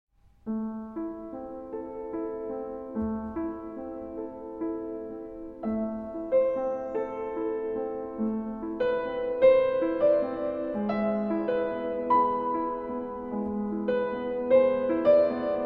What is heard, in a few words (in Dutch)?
wie maar de goede god laat zorgen pianosolo